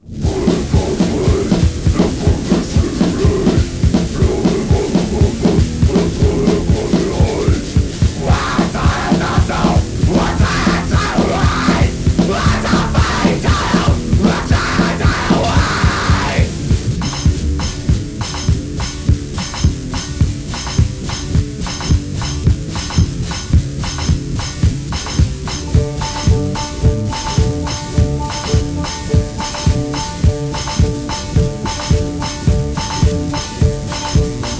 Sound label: death ska banjo